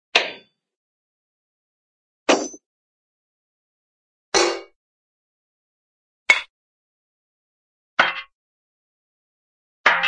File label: hit, metal metal clank; cartoon